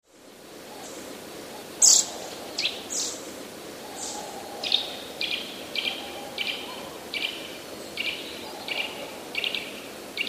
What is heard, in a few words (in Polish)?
kowalik wysoki tryl; wysoki wibrujący głos kowalika